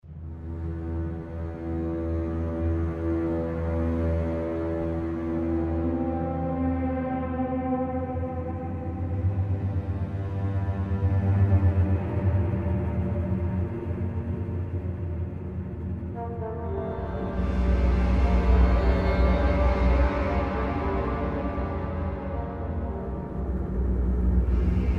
dark atmosphere

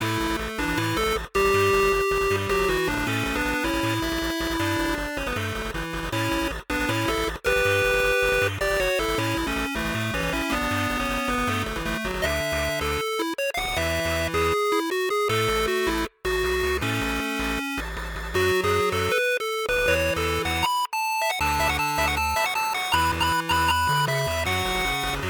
music stats screen

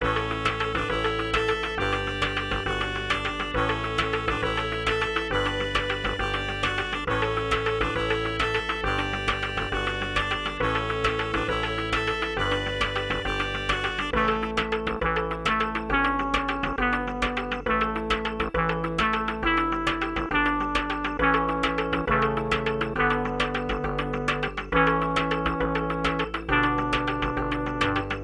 music ingame theme

